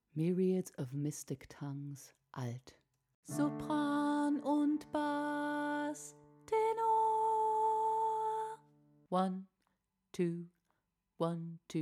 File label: soprano